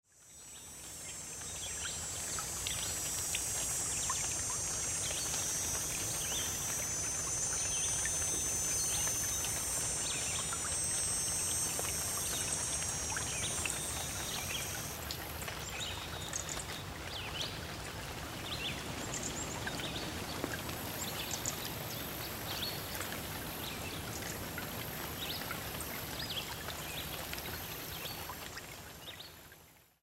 binaural beats birds in the garden with a fountain; sleep loop